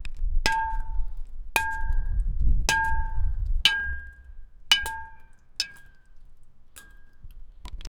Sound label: the sound of water droplets rhythmically hitting a metal surface